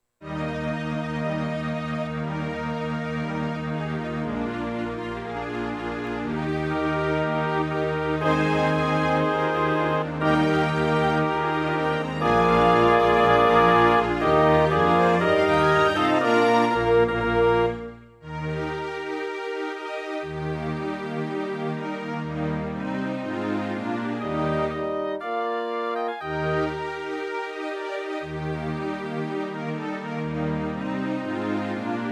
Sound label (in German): hornkonzert orchester; mozart